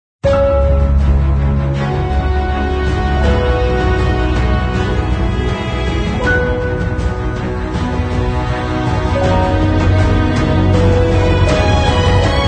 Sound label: Logo version